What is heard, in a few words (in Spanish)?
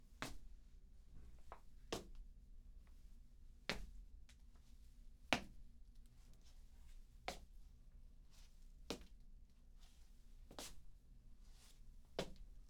Pasos Pasos Zombie